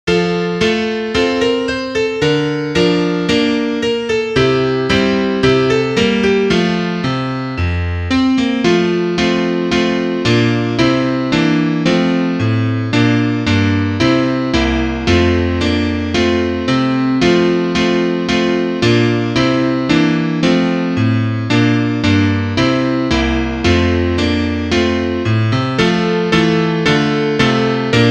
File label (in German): Klavier; auf unsrer wiese